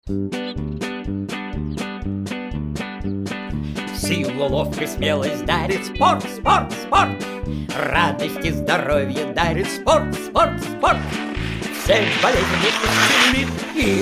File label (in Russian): Sportivnaya vokalnaya